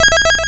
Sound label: confirm save; trilogy